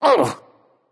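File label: non verbal ouch, voice; cernunnos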